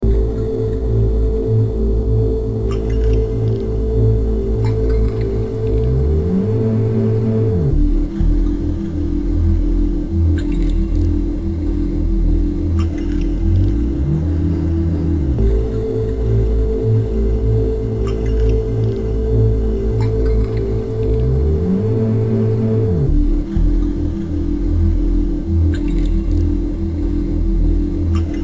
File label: waterhum